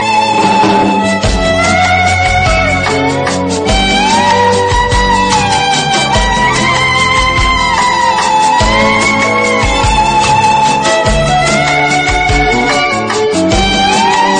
instrumental ringtone

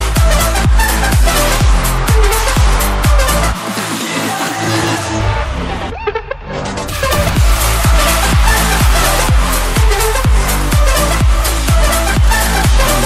best taiwan ringtone

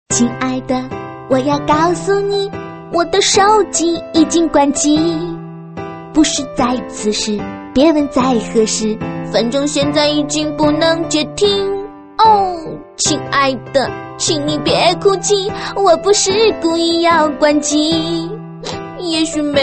cute chinese song

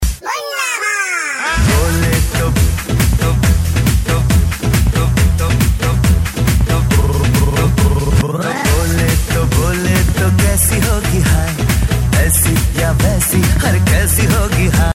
remix, ringtone; lage raho munnabhai; salty sugar

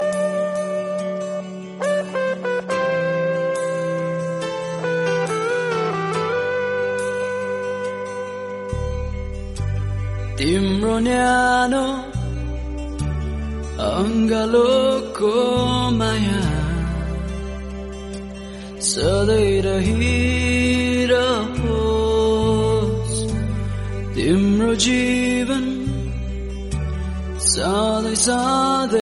nepali song

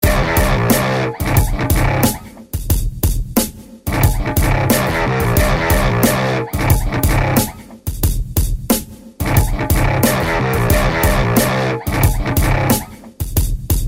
roking ringtone

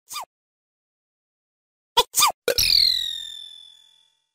cheers notification; ringtone

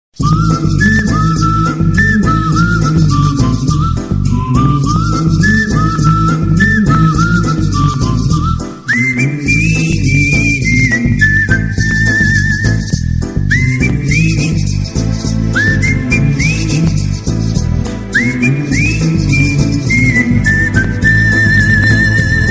whisle

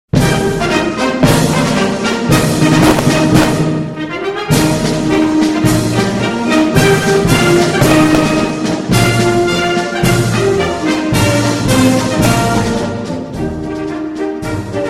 chinese parade

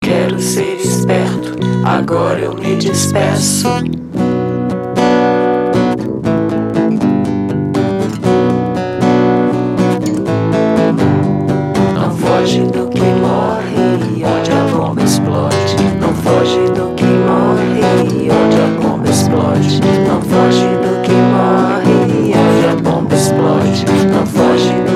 bomba rap ringtone

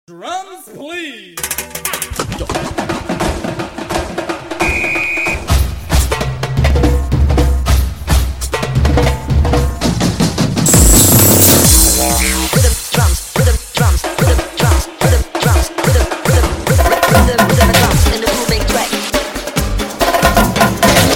latin drum mix, ringtone

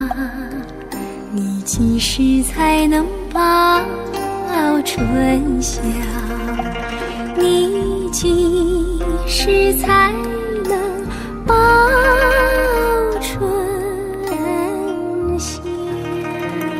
chinese ringtone

